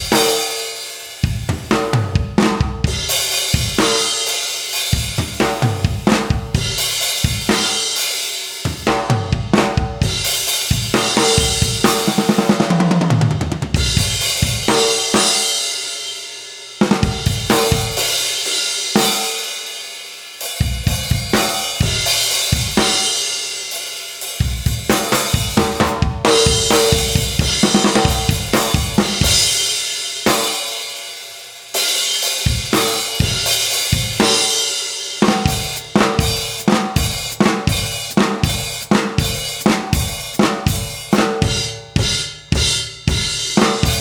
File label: Drums